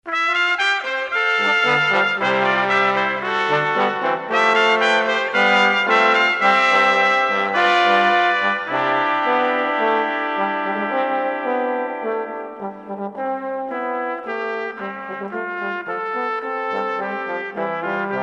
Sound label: quartet, Brass; Jesus Christ is Risen Today